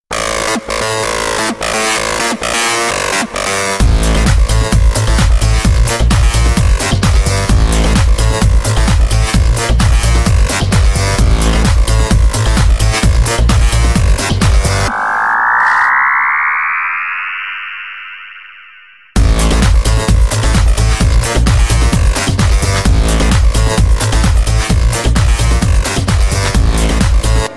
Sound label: Music